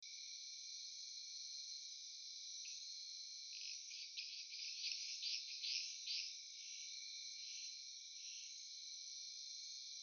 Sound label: Agalychnis dacnicolor, phyllomedusidae